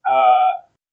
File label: arghh